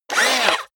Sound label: robot movement fast